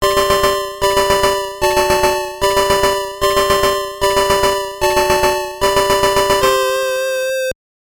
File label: Unknown Jingle; super monaco gp 1990; game gear